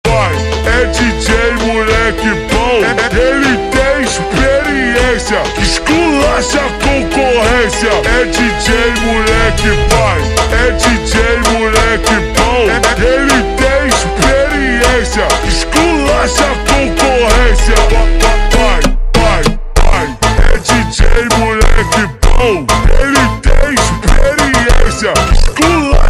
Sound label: Funk Super Slowed